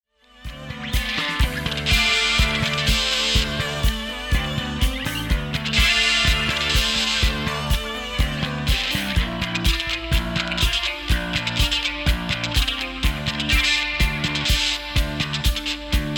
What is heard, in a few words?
Catch strum